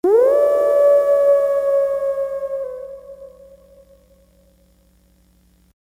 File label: Wolf Howl; Modular Sound-FX; David Ryle's Goofy FX sounds